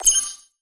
Menu Weapon Disassemble; Custom UI Sounds; Call of Duty Advanced Warfare

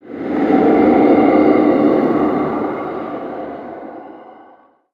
Quest Failed; Custom UI Sounds; Bloodborne

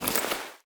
Backpack Open; Custom UI Sounds; Dying Light 2 Stay Human

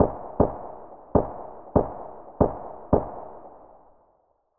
mutant tap fast; SPT Battle Ambience

